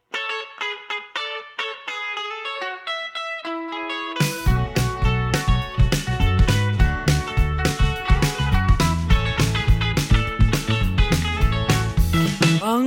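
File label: Indie/Rock Choir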